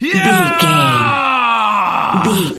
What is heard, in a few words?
voice male battle shout